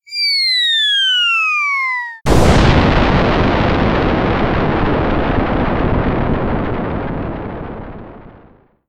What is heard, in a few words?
bomb falling and exploding